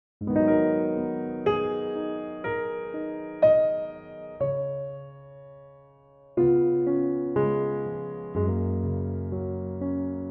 piano

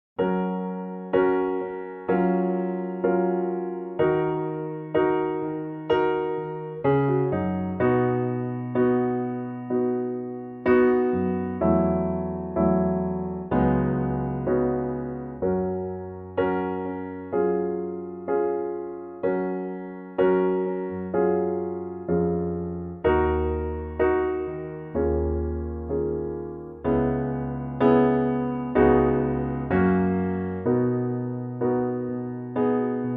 piano

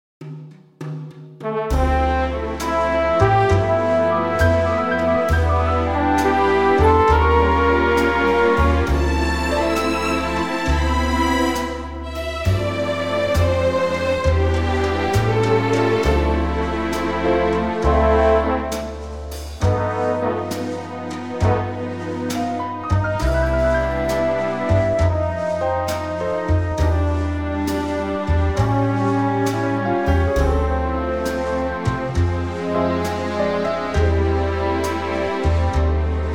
orch C; key - C - vocal range - A to D

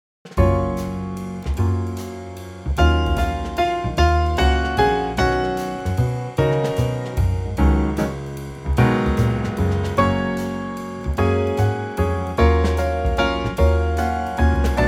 Trio; key - Gb

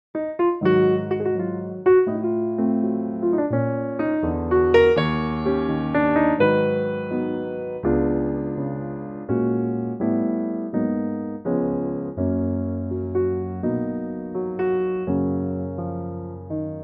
Gb piano; key - Gb - vocal range - Bb to Db

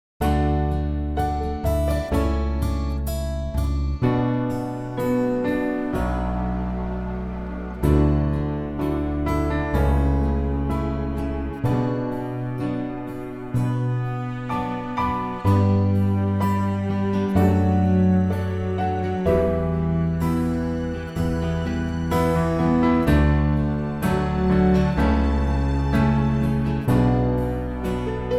D acoustic

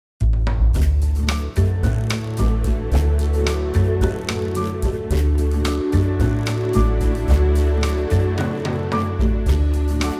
latin D; key - D - range - A to D (optional F#)

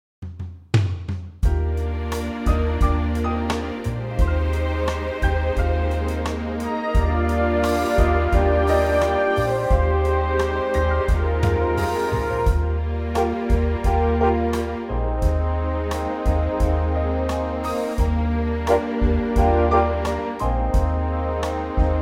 Eb orch; key - Eb - vocal range - G to F

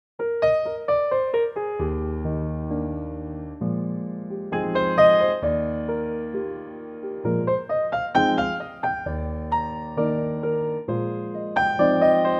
piano Eb

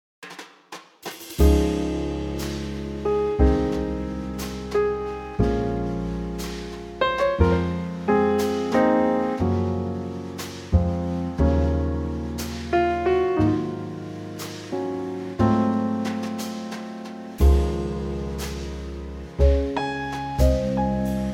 Trio Db

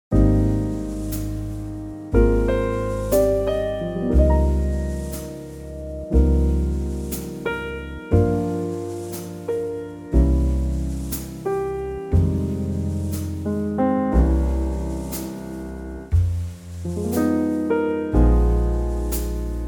Eb trio

